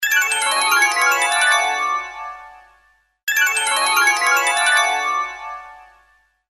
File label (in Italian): volume alto, suoneria